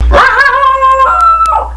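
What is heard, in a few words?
male yodel, basenji; Natures Masterpiece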